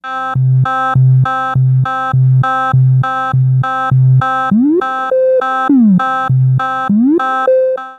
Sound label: glissando illusion; only the channel to the right; This is a stereo sound file; 8s fade Right